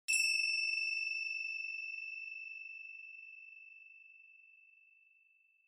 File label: Finger Cymbals Dry Long